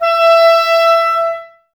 clarinets, Wood Winds

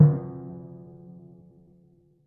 F2 p, Timpani, piano; PowerFX; Downtown Orchestra; scales

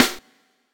SD, dance drums hip

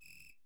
Whistle Long, Perc; LA Perc; Akai MPC-500

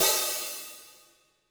hat open

